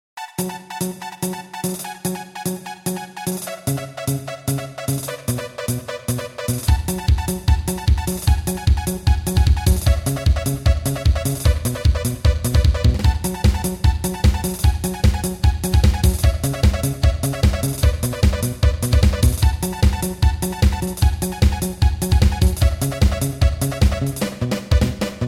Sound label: Nokia Humming Bird